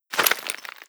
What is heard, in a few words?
debris concrete, bullet impact debris